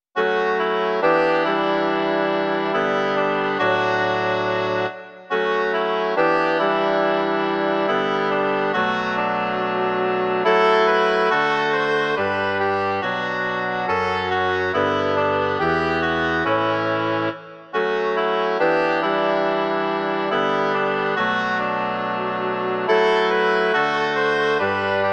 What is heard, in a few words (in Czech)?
2 hoboje a 2 fagoty; Dej mi, Pane, bdělé srdce; Imaginativní verze